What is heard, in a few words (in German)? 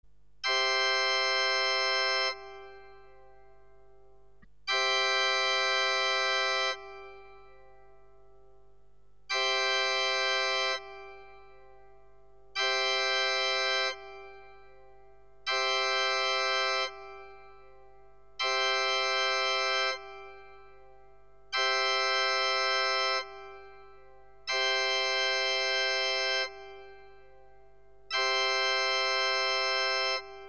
mixtur charakter, sakralorgel